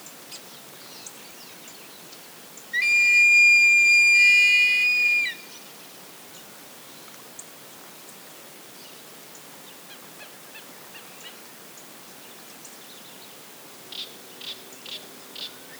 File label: Buteogallus urubitinga; Zwartearendbuizerd; roofvogels; Accipitrinae; Accipitres; Accipitidae